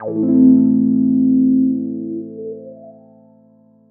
GUI CHORD; EastWest; Dance Mega Hip Hop Electro

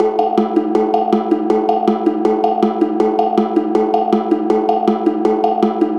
Metal Tank Tongue Drum, processed; Sonic Foundry (Sony Creative Software)

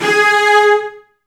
Virtuoso Strings, TRM FX; ILIO; Kirk Hunter Virtuoso Strings VOL-2; 90 s Sample CDs; 10CL TRM FX

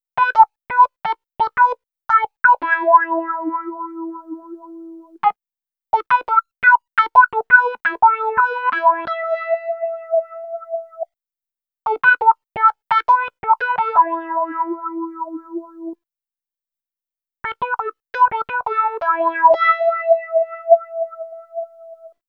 EP Funky Lead, Electro Lounge; Sonic Foundry (Sony Creative Software); 086 Sister Strut

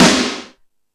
All Snares, E-MU Pro-Cussion; drum machine

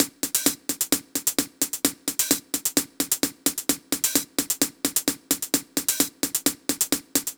Hats Loop, 130bpm; Zero-G; Soundclash Nu Dance Electro; Construction Kits; Feet First

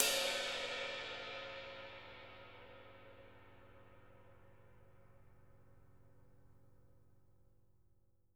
rides; JZ RIDES; Living Drums; Sampleheads; Peter Erskine

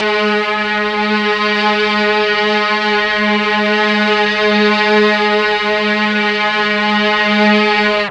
G#1 16 VIOLI, Mellotron; TRON 16VLNS; Mike Pinder - Mellotron Archive CD

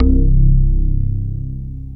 WOOD FX LO, THE ANALOG; Back In Time Records; Big Bang

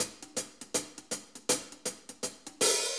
Shuffle HiHats; Sonic Foundry (Sony Creative Software) - Loops & Samples Drum Tools; Shuffles

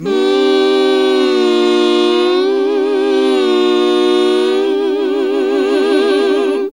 GOSP., GOSP. MMMhh; Sound & Vision - Gigapack II CD 1 (Roland); HAL Gosp. Mmmh

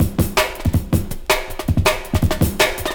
Cool Break 162, breaks; minihalfs

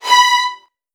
B3, Strings, VN 16TH; ILIO; Kirk Hunter

